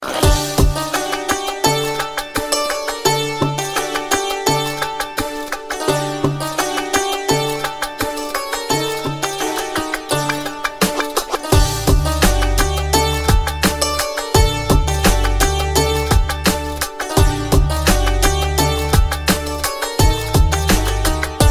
arabic guitar

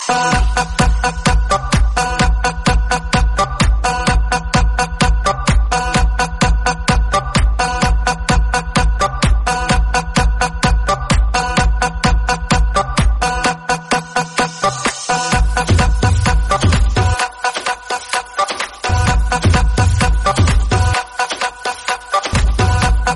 dirty electro 132

